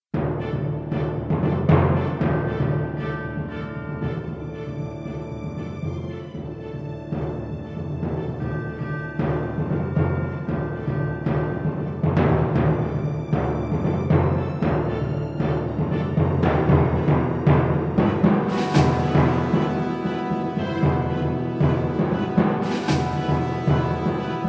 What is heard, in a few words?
Combat Music; DS9FX